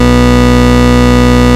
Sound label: Root B, Ultimate Bass; Vengeance Samples